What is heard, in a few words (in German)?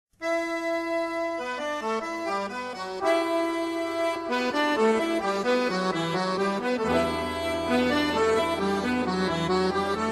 Akkordeon; Akkordeon-Quintett